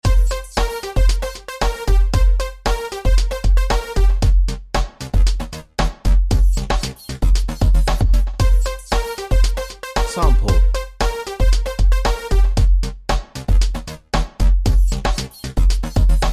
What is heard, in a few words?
beat